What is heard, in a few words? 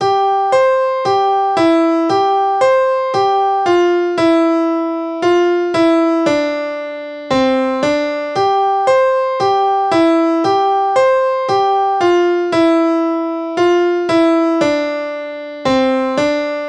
piano